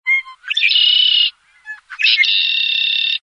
birds blackbird call

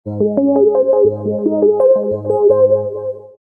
warble cascade

